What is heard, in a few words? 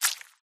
squish